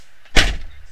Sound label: van driver door closing